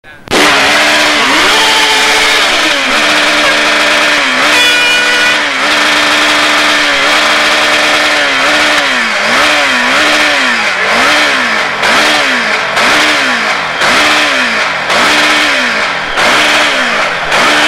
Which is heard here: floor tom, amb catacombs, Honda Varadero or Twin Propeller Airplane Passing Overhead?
Honda Varadero